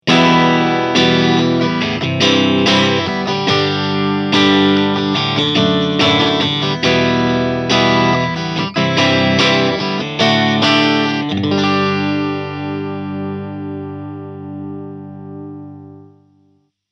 Clean; Eventide Riptide

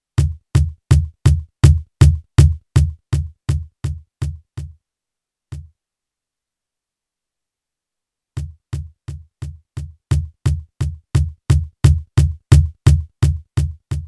Cajon Bass

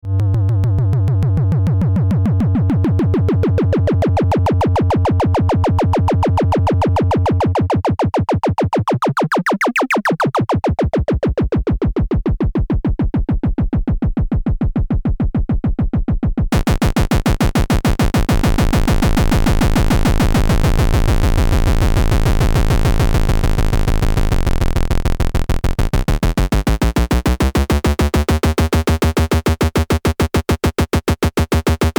Kicks; Xaoc Devices Sofia

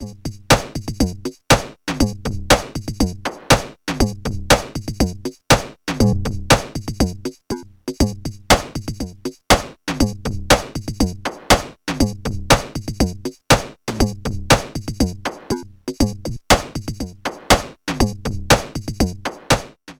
Transient Plus RING Mod OSC1 slow AM OSC2; Future Retro

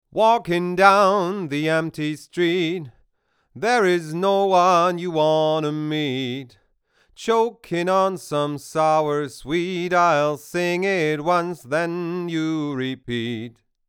SPL Crescendo duo Vocal MKH40 21 dB Gain

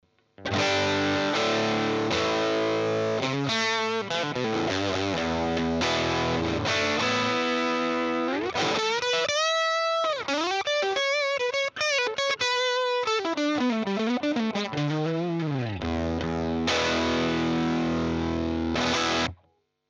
Woodrow 55 GB25 STOCK Boost

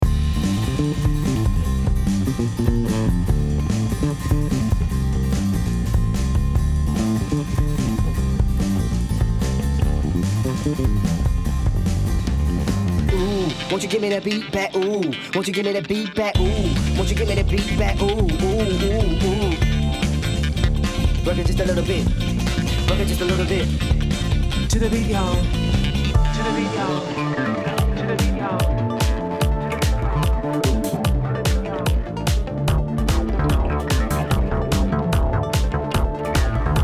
Tierra Audio Gravity VCA Mix

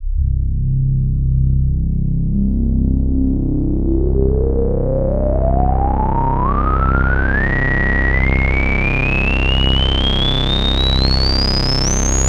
Filter Resonance

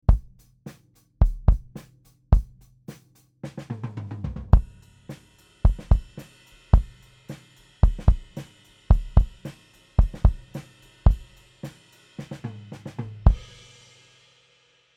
Kick Out Full Set; Austrian Audio OC818

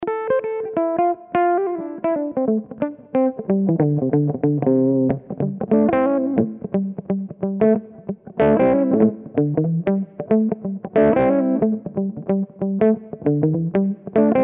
Bridge PU tone cut; George Benson

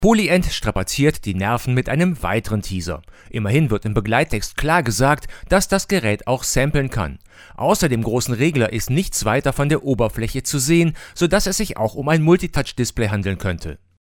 GAP Pre 73 DLX MKII Gain 50 Pad 0 HP Off

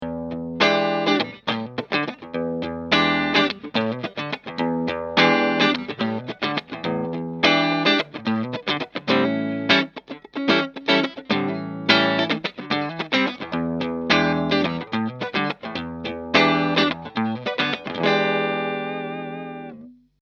Bogner Clean SM57 on axis

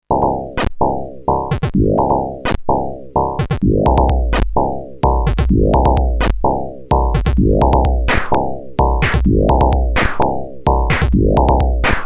DCM8 Beat on the fly programmiert

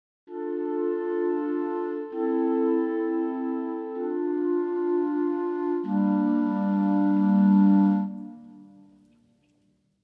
Traditional Clarinets ppp Sustain; VENTO

